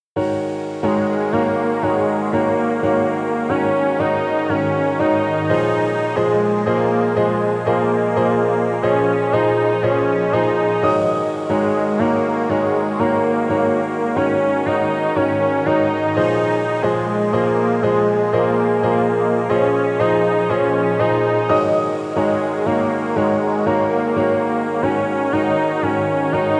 High Energy Melody 90 BPM

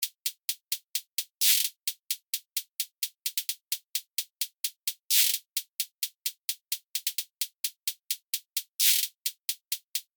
Grater Hi Hat Loop 130 BPM

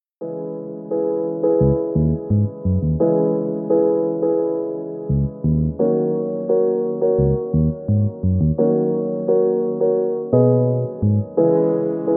Chilled Melodies; standard pack 2

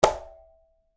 Bongo, percussions; tribe percussions

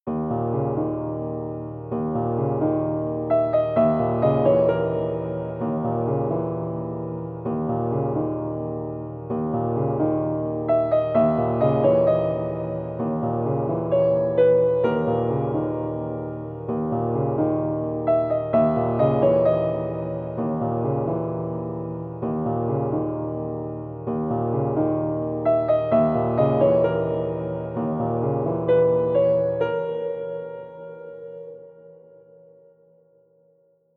Piano Melody 130 BPM

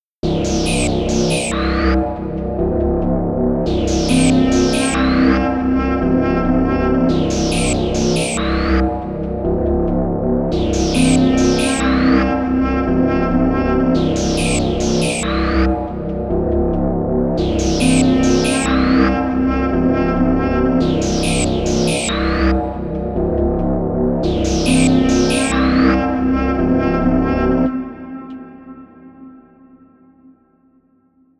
Adrenaline Melody 140 BPM

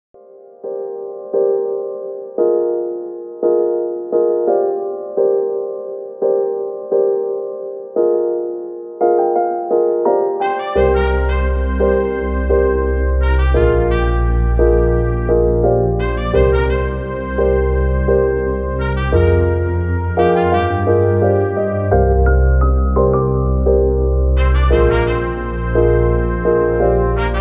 Brass Melody; ambition brass melodies

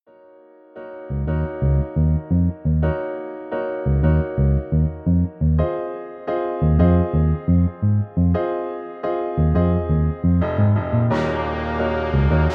Brass Melody; ambition brass melodies

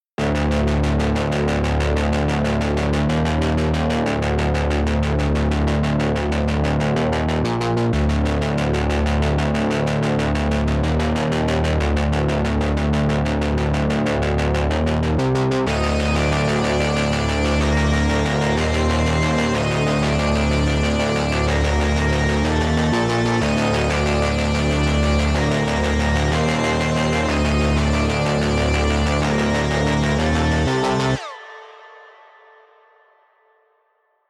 Mechanic Pulse

